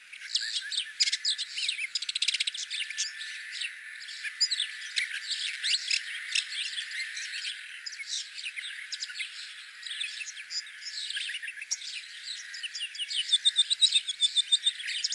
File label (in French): pie grieche ecorcheur